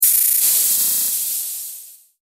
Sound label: Flat tire; Jesse McConnell